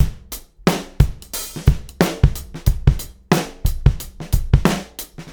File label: 90 bpm breakbeat sample g sharp key, Free drum loop sample - kick tuned to the G# note.